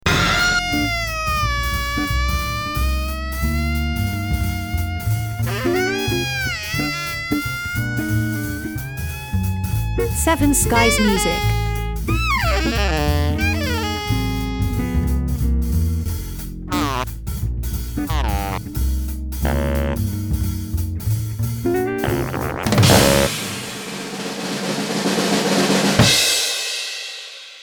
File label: Drunk saxophone